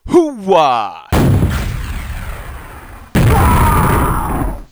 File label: hooah